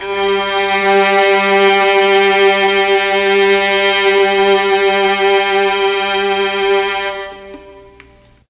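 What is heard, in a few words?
G1 violins, mellotron; 3 VLNS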